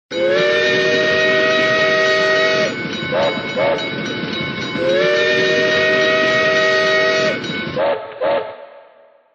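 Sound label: train sound; zvuk poezda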